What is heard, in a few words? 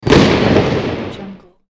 explosion